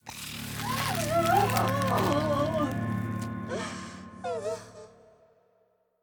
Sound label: Spider To Human; The Inn; Zara